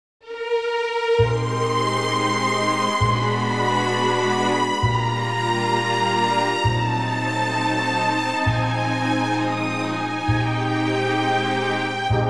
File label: E b orch